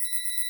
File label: count Up Loop; jack and beanstalk